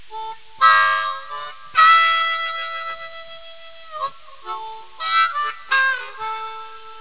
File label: harp